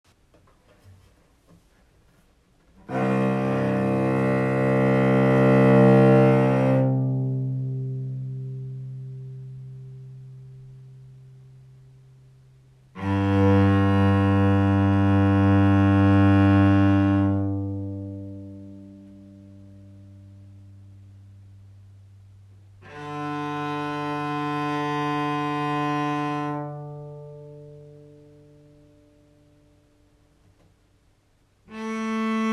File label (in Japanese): 開放弦と和音, cello